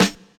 Natural Snare One Shot G Key, snare single hit tuned to the G note; snare drum samples